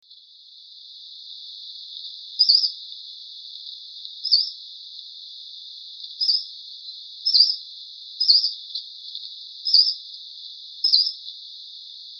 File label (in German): gelbbrauen-laubsaenger